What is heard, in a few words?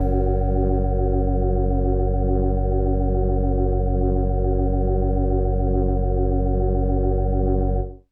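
Indie Organ Kawaii F#0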